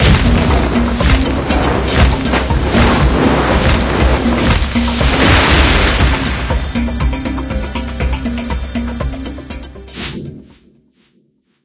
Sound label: bigwin; games; Fenix Play 27 Deluxe; Wazdan